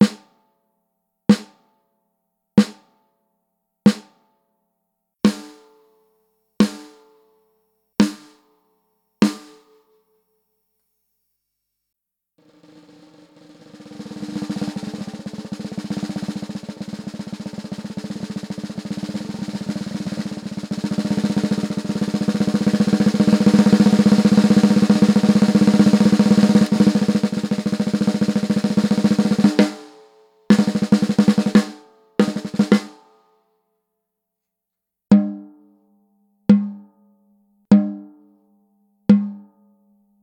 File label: oak 14x5, snare drums